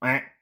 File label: duck